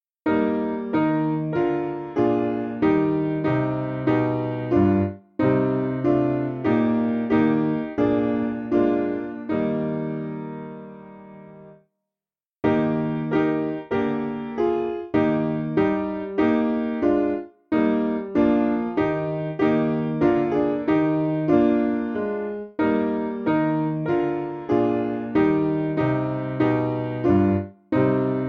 Jesus Tender Shepherd Evening Prayer Piano, Free Music Backing Files for Churches; Simple Piano